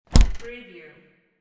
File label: chest freezer door close